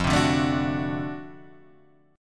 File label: D 7th F, guitar; D7/F strummed; JGuitar